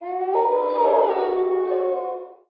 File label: owooooo